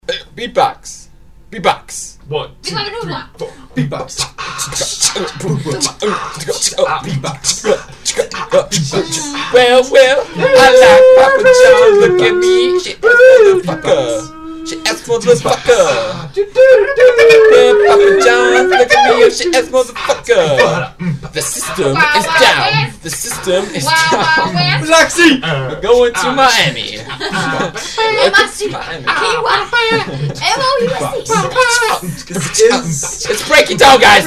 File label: BeatBox